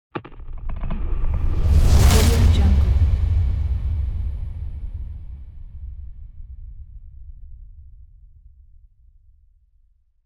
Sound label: explosion sound